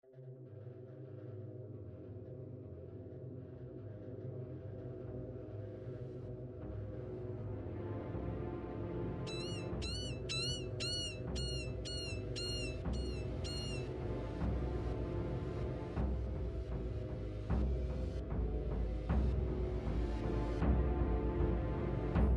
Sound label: drums of thunder